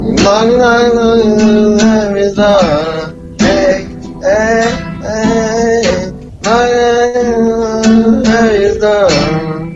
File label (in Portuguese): cantando